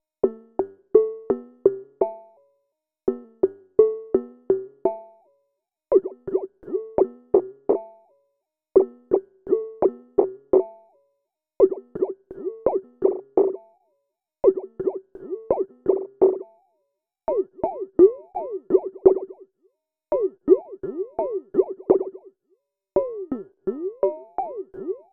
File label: Bastl Instruments Popcorn Slide Time